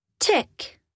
tick

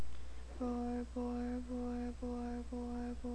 boar

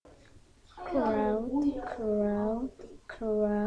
crowd

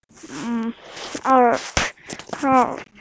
harp

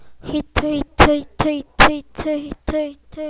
hit

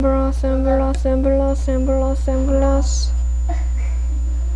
ambulance

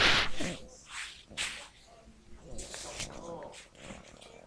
pad

